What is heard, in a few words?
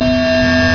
Destroy; IQ2 Demo